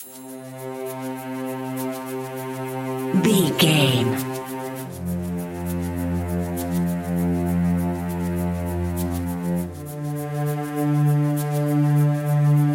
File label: drums and bass